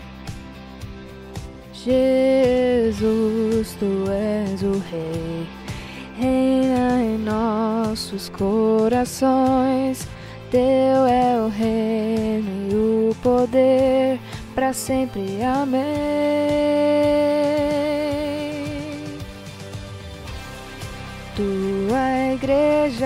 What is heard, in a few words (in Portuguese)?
tenor; a igreja canta